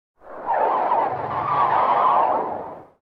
Eerie Low Frequency Synth Gust; Electronic Sci-fi

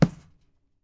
PUNCH, FIGHT; 1000fx

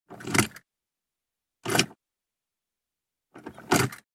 Keys Into & Out Of Ignition, Cars

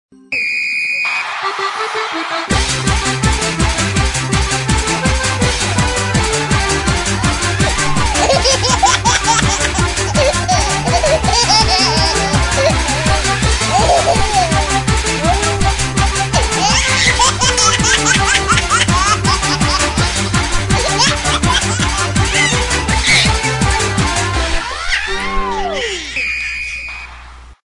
Funny Remix Ringtone

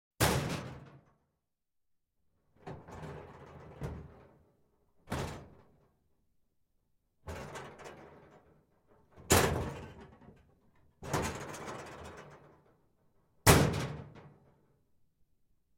Door Locker Open-Close w Bangs-Rattles; Doors, Squeaks

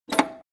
Pay Phone Receiver Pick-Up; Telephones

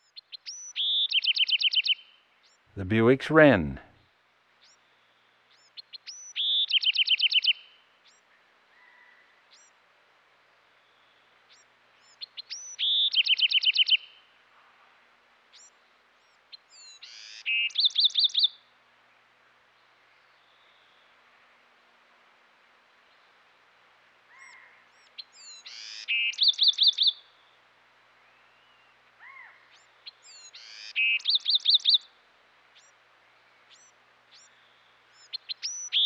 Bewick's Wren; Western North America; Dan Gibson; Wildlife Identification By Sound

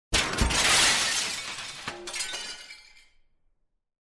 Plate Glass Window; SOUND EFFECTS1

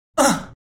Grunt Male w Exhale Close Perspective; Human Sounds

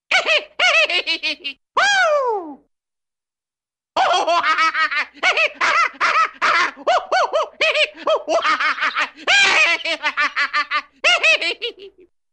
Funny Crazy Man Laughing; Hollywood Edge Cartoon Trax

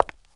CONCRETE, FOOTSTEPS; 1000fx

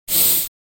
Sniff Close Inhale Through Nose; Human Sounds

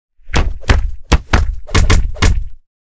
Kick Block Punch Combo; Hollywood Edge Martial Arts and Human Impacts

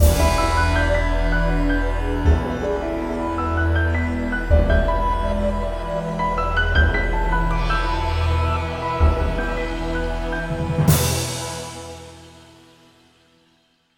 Creepy TV theme

